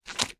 cartoon pull squelch suck

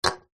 beer can empty set down table; food drink; zapsplat

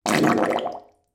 human fart shallow bath

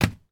household disposable nappy drop on hard surface

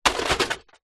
robot small android metal fall over; science fiction

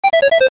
arcade game tone

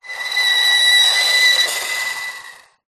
plastic small item scrape screech metal; foley

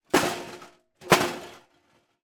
impacts glass metal tin

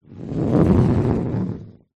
massage roller ball roll on wood

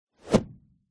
science fiction weapon bullet pass by whizz fast

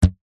elastic thin stretched ping; zapsplat; foley

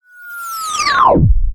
sound design digital descend shutdown; dustyroom

